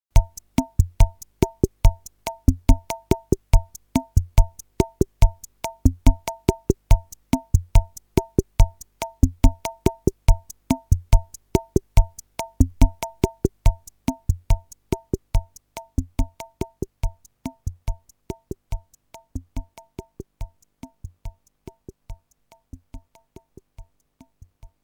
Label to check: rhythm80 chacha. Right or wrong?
Right